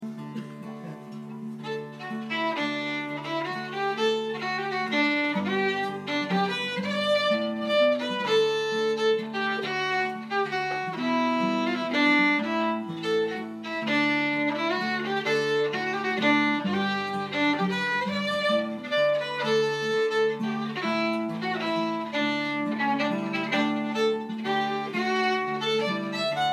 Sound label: Waltz